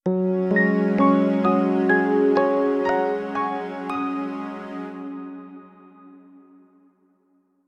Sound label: Sys Log Out, Una scala discendente.; Termine sessione KDE; ubuntu